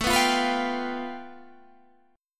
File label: A 7th Suspended 2nd Sharp 5th